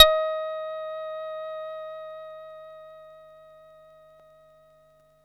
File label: PERC ORG G, SYNTH; Trance; AMG - Global Trance Mission VOL-2